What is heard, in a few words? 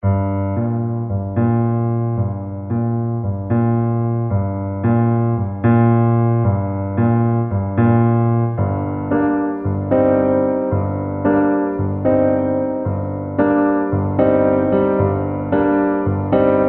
PIANO SEQUENCE